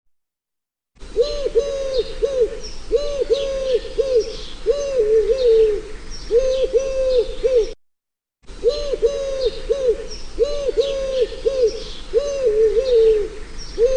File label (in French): tourterelle